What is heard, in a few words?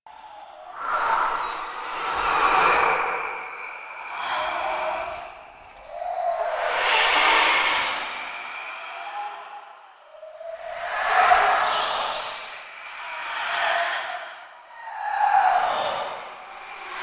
Apparition Breathe Loop; Warframe; Dagath